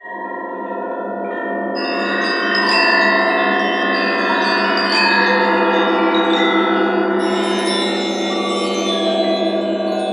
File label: BEL LOOP; eShop